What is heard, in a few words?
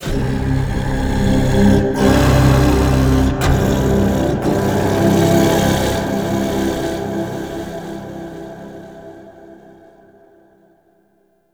Reveal Vocal; Warframe; Man In The Wall